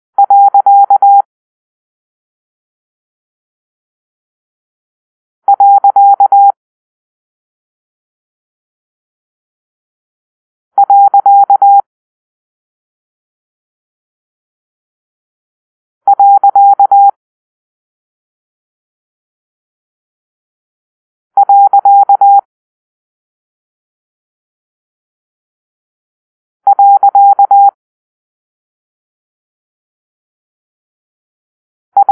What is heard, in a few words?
Period, morse code